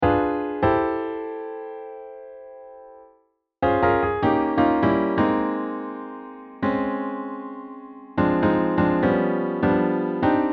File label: chords